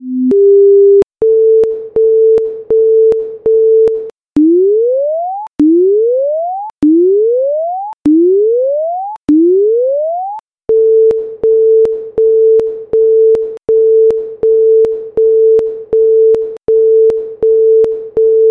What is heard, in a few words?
A L A R M - tone